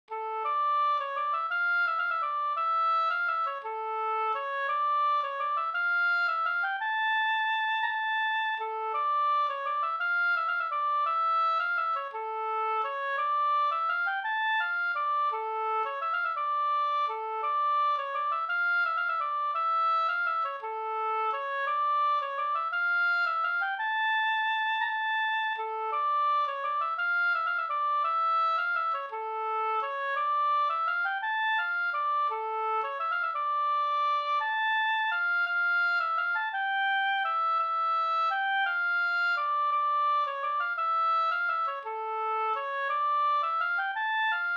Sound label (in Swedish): vals Mollvals